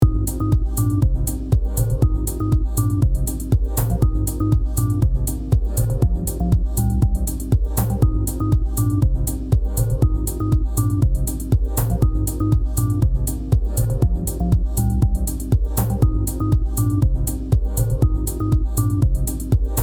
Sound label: Synthesis